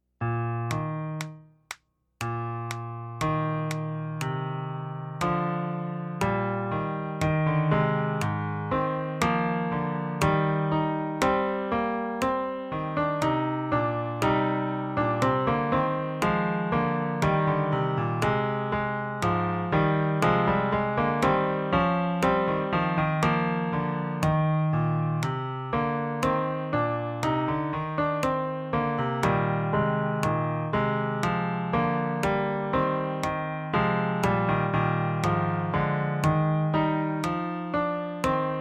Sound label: Verbum caro Accomp @ 120